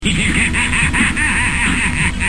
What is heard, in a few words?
laugh; skywarp